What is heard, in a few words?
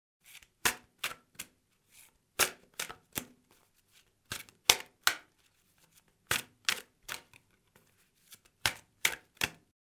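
sound of playing cards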